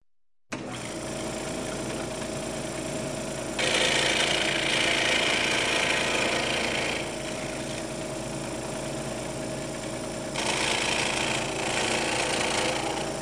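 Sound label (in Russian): электропила пуск пиление выключение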